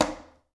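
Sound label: conga, left; Spectrasonics; Liquid Grooves 1 & 2; CONGAS+BGOS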